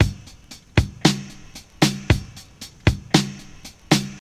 115 bpm fresh house drum loop sample g sharp key, Free drum beat - kick tuned to the G# note